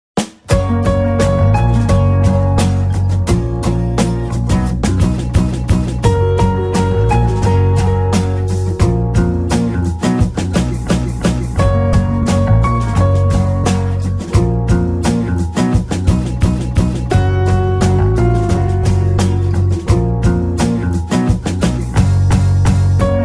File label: instrumental sped up Gb Maj